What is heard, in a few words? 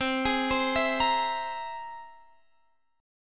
C Augmented 7th; arpeggiated; x,15,18,17,17,18